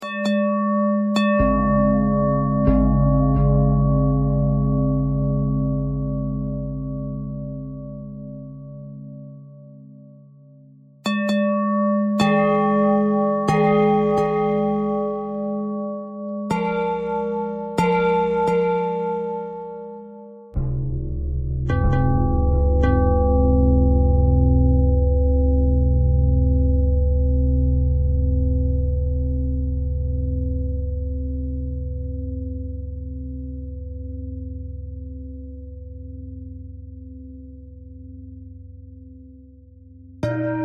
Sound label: bell thread pentatonic